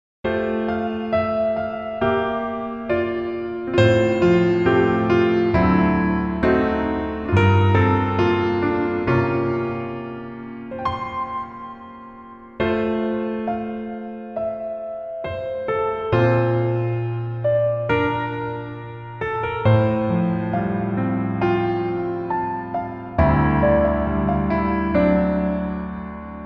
Piano ver 멜로디 MR

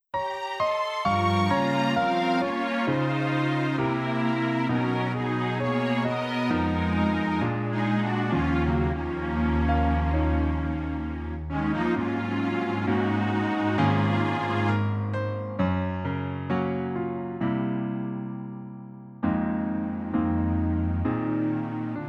Piano Ver -1키

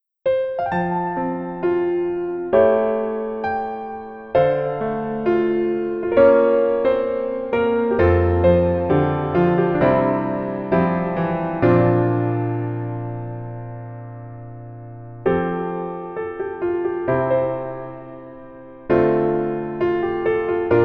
Piano ver 멜로디MR -1키

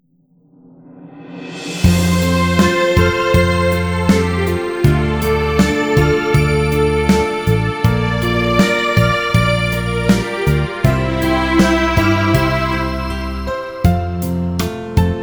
멜로디 Piano F Key

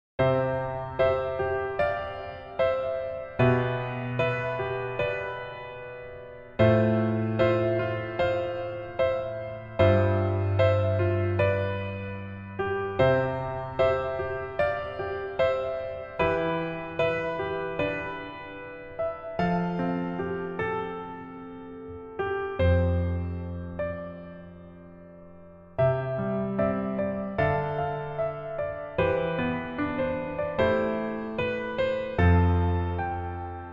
Piano 멜로디 MR 여자키

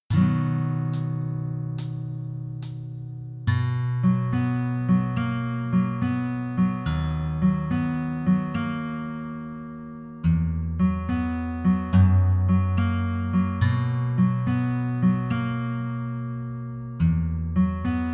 Piano ver 남자키

